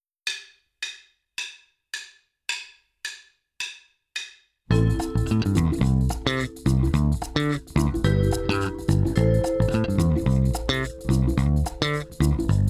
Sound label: Funk drumless